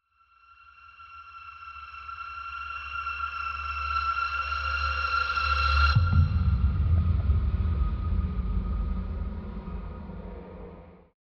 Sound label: ambient; pzserver